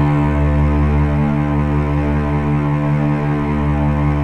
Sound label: Cello